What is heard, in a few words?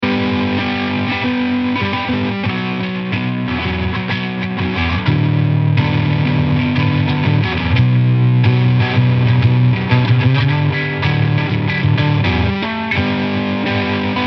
Digging